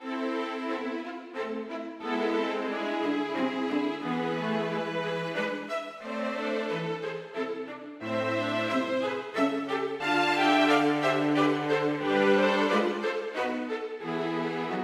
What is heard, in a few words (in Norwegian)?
Menuet for strykekvartett